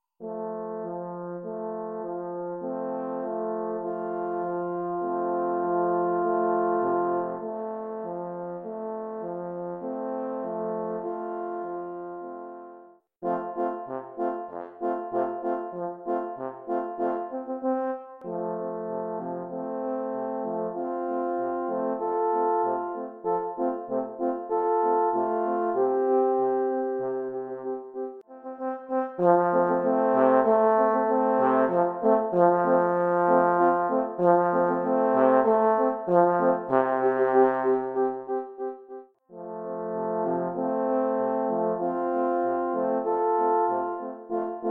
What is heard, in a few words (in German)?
Polka